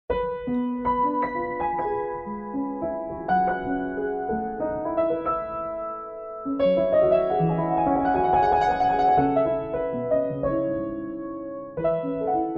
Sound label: Piaderavi Ba Piano